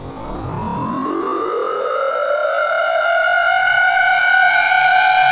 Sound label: Riser